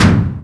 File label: Collision Ext